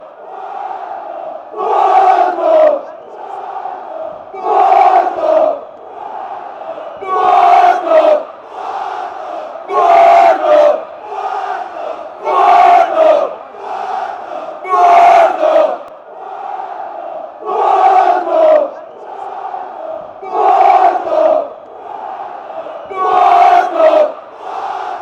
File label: porto porto; fcp